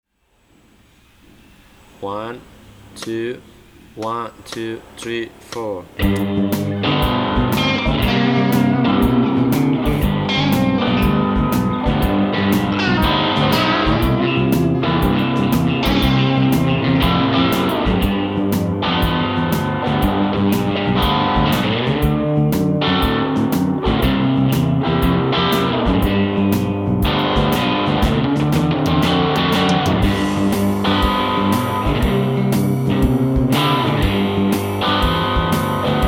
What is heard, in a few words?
blues fast G